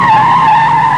squeal; Vehicles

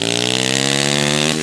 engine

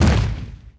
boulder land dirt; impacts explos

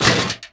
metal chair hit; bouncyobjects; Tony Hawk's Project 8; XBOX

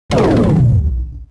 Activity Lose; Freestyle

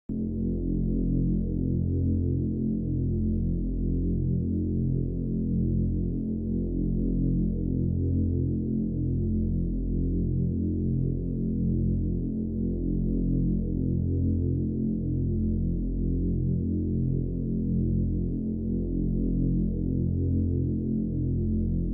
pad; Myst III Exile

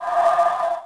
scrape; Quantum Redshift

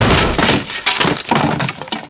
Wood Break; breakable

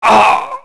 get hit; characters

